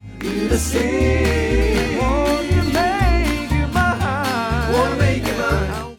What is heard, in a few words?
country